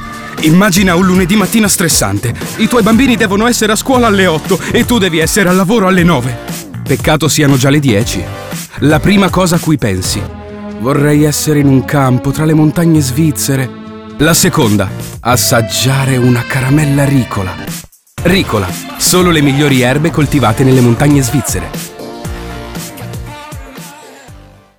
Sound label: music; ricola